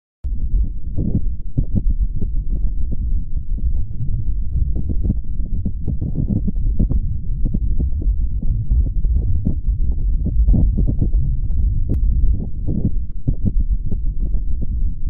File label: Martian wind